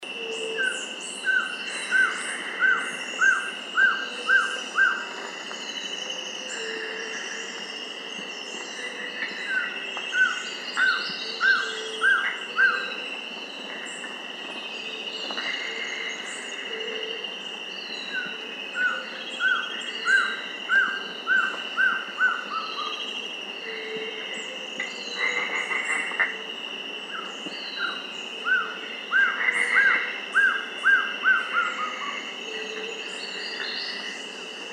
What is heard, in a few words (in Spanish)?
Trogón enmascarado Trogon personatus sanctaemartae